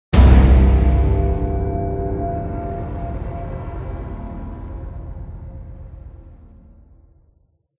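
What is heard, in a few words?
Jingle Result Failed; Titan Slayer II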